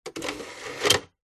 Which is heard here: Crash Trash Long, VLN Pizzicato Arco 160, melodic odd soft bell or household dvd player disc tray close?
household dvd player disc tray close